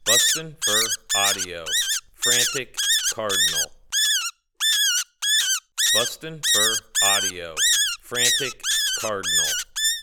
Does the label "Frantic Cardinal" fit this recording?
Yes